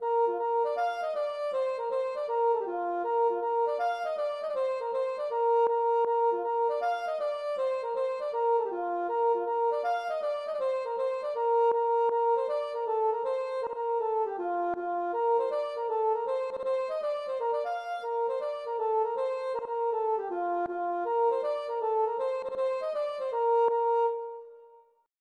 gavotte; Gavotte pourlet No11

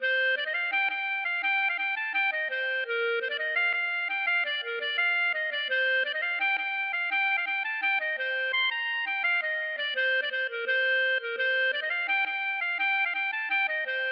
marche

